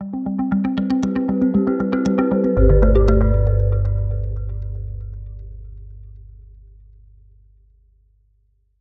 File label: Minimal Gamelan, Short Arpeggio